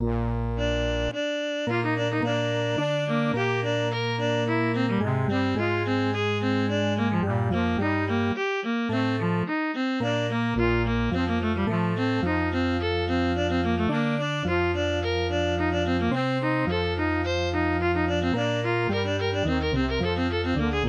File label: MIDI